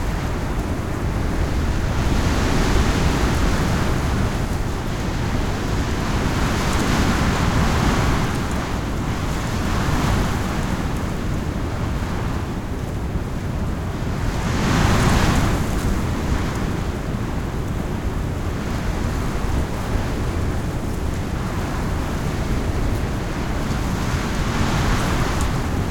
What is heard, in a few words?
amb wind intense; sons of the forest